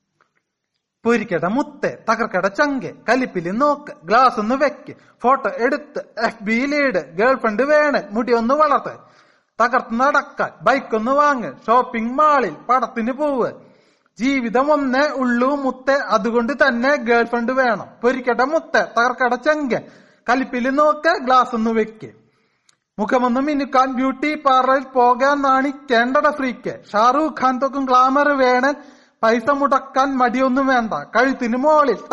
malayalam rap

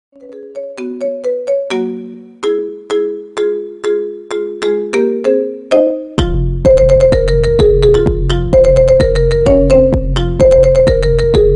marimba ringtone remix

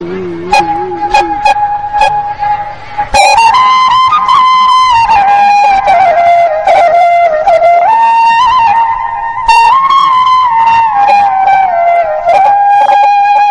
flute

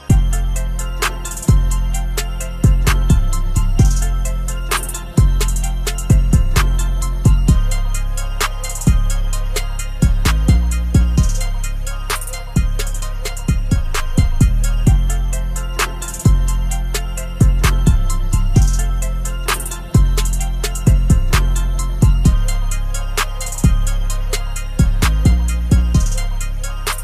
instrumental

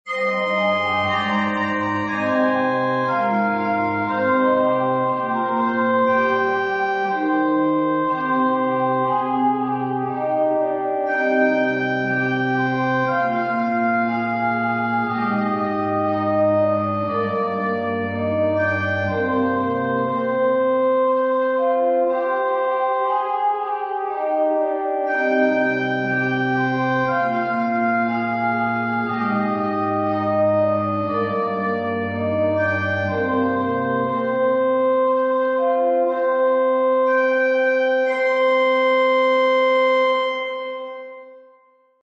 maltese pipe organ prelude; Balzan

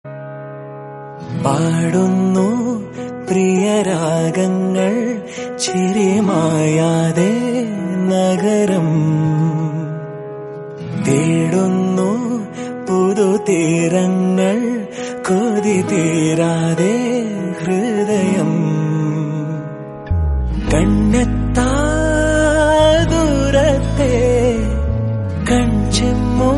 malayalam hit song ringtone

